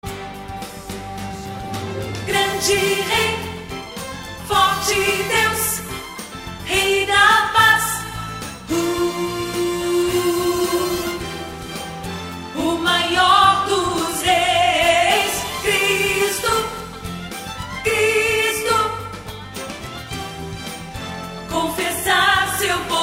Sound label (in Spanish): Contraltos; cantata navidena